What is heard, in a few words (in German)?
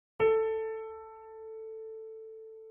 Ton, Klavier (einzelne) Töne